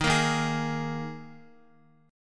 Eb Suspended 4th Eb Guitar 4E 4A 5D 5G 5C 6F; Ebsus4 strummed; JGuitar

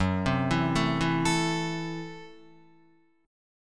Gb Minor 7th Flat 5th, Guitar; arpeggiated; JGuitar

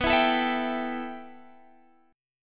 B 7th Suspended 4th Sharp 5th, Guitar, Standard; B7sus4#5; strummed; JGuitar

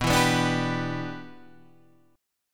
B Suspended 4th Sharp 5th Add Sharp 9th B; Bsus4#5add#9 strummed; JGuitar

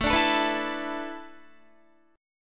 A Suspended 2nd Suspended 4th; Asus2sus4; strummed; Guitar

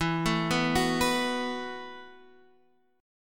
E Suspended 2nd Suspended 4th E; Esus2sus4 Chord {X 7 7 4 7 7} arpeggiated

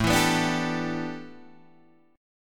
A 7th Suspended 2nd Suspended 4th Guitar Open D; 7,7,9,8,10,7

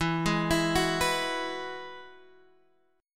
E Major Add 9th, Guitar Half Step Down

